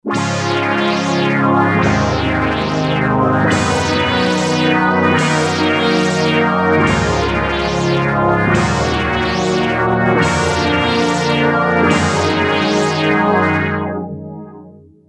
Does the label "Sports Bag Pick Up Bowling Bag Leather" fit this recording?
No